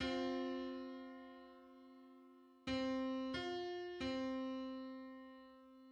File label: Six-hundred-ninety-third harmonic on C